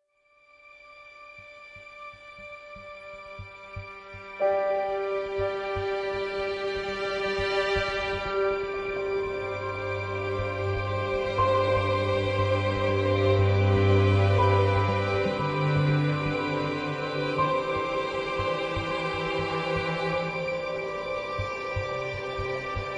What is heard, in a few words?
Intimacy Ambient